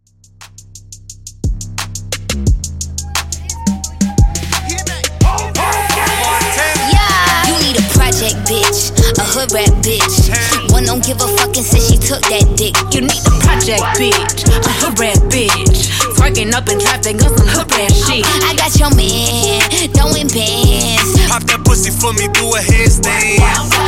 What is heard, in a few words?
Workout Hip Hop 87Bpm